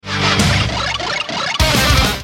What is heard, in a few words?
tremolo